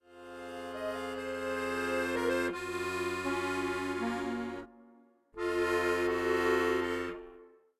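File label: Accordion Practice; legend of zelda the breath of the wild the champions ballad soundtrack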